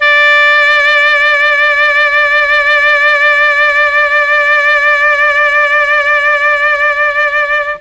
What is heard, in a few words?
D3 alto sax, mellotron; CHMB ALTOSAX